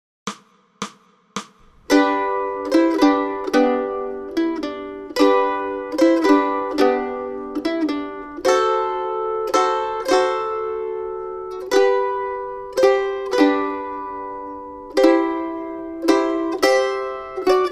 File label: Mandolin; Silent Night